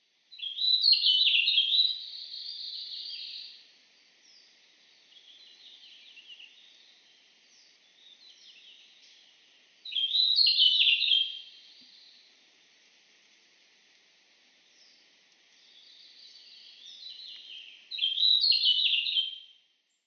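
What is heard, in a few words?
Red-flanked Bluetail; marunuma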